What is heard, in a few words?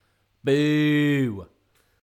Boo; Pitch Black Audio Pong